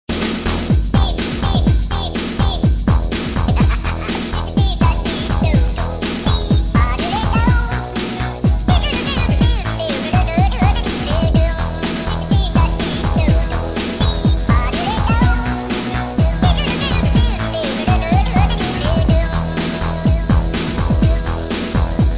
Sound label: Hamster Ringtone